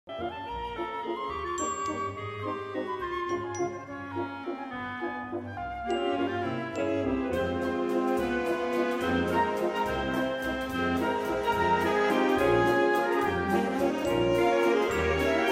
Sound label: Concert Waltz